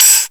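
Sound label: tambourine; TAMBOURIN KT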